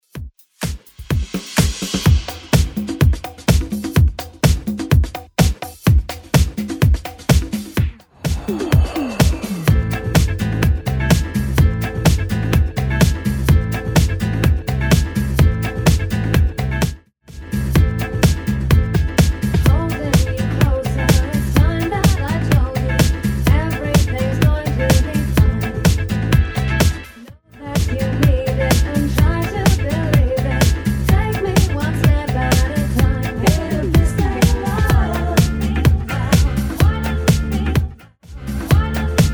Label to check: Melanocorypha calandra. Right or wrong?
Wrong